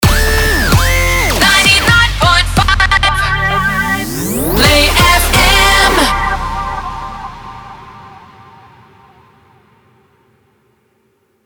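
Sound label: 130bpm G minor Shotgun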